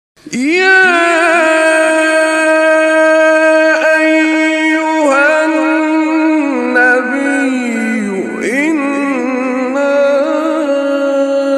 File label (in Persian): تلاوت آیه سوره احزاب; آیه 45 47